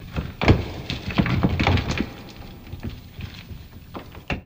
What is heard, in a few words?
Big Rock Drop Debris, Big Rock Drop With Debris